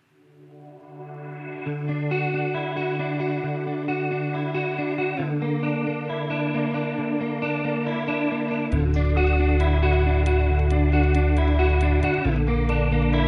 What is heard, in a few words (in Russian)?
dva golosa